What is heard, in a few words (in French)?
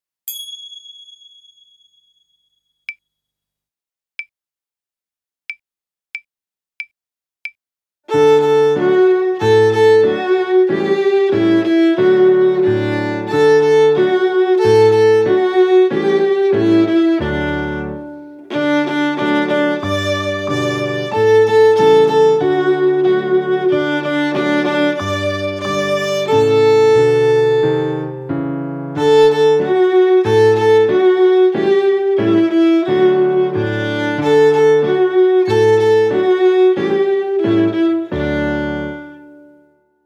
Jeu denfant Alto